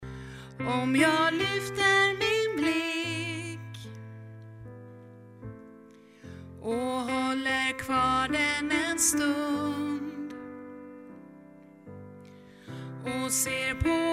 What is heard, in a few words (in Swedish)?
det kunde varit jag sopran